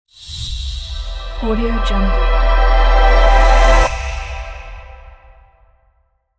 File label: intro sparks logo music